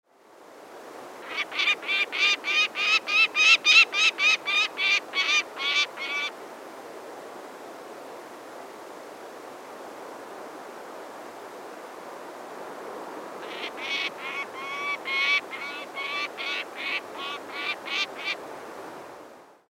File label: Falco peregrinus call über Nest kreisend